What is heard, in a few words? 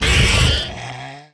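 death, ripper; giants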